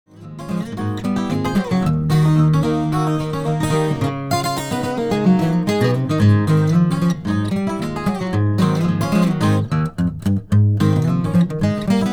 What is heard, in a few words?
Acoustic Guitar